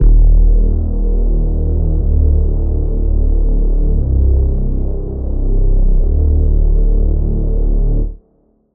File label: Reese